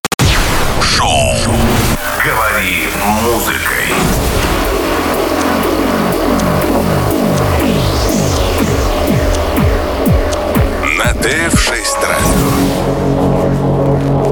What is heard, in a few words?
jingle